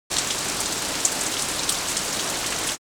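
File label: Water Rain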